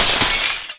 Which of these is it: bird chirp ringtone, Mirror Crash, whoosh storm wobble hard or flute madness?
Mirror Crash